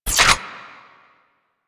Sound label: Shape Blast Shotgun Reload; Warframe; Weapons; Corpus